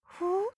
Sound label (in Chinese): 微信 聊天框消息, 手机提示音; Hanser手机提示音包